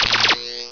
build

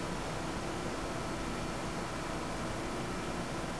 ambient vents; chaoscity

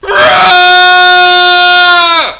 voice battlecry; firearms; radio

